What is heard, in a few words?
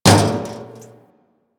Physics Barrel Impact Medium, Metal Barrel; Physics Objects